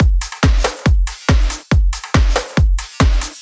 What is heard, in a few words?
drum Loop 140BPM Full